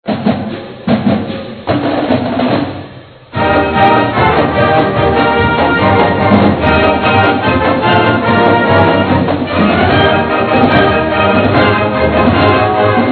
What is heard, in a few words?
Fight Song; Miami Hurricanes; Blow Canes; NCAAF Football